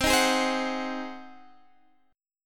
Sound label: Ab 7th C